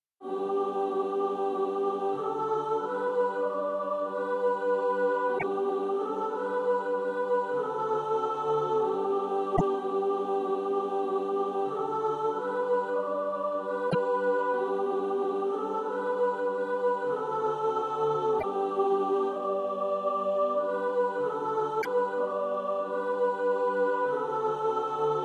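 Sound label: soprano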